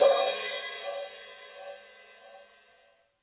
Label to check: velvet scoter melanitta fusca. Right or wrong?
Wrong